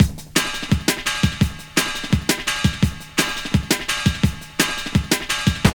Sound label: LOOP, Tekno Industrial; East West